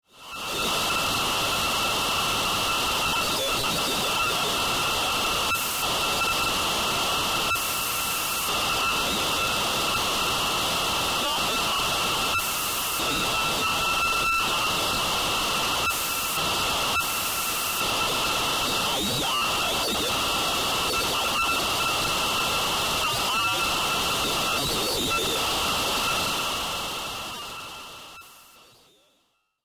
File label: Science Fiction Communications Distorted Radio Signal